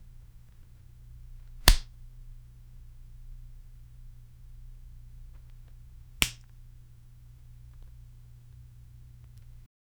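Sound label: quickly squeezing a fabric, crunch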